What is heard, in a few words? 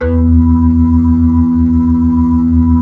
B-3, Blues; Keyboards of The 60's and 70's